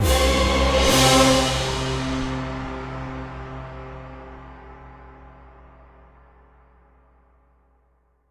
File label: jingle boss appear